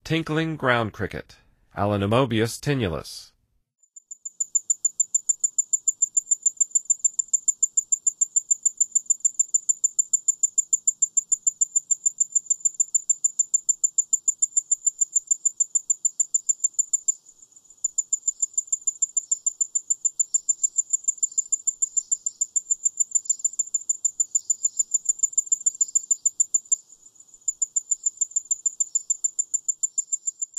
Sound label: Tinkling Ground Cricket; N. America